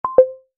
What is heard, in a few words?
new Message